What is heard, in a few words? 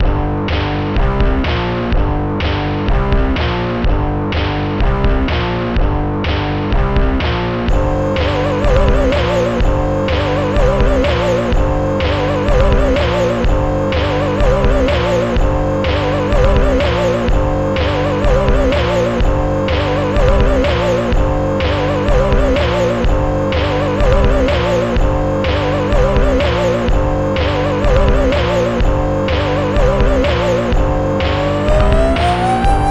music